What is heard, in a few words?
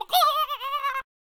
Death Vocals